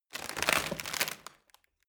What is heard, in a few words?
rotten wood break